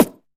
Snare A#, snare sample tuned to the A# note. Loudest frequency: 2836Hz; snare drum samples